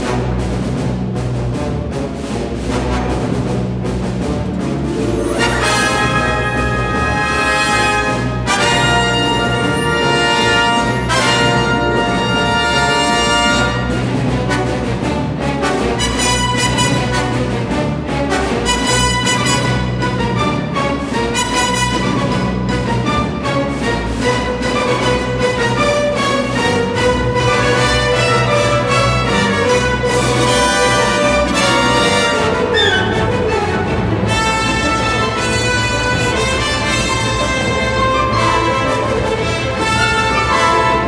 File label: destroy loop